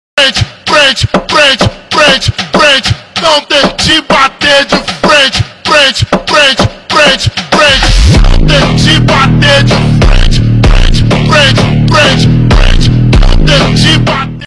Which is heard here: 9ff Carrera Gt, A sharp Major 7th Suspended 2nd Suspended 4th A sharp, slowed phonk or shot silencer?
slowed phonk